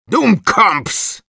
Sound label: Medic negative vocalization, ru; Dummkopfs!; Идиоты!